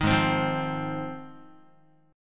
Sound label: G sharp 6th G sharp down Guitar, chord; strummed; JGuitar